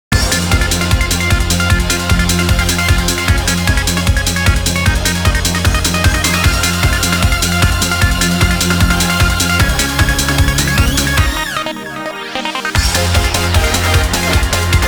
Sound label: (Eurobeat Version); DDR 2013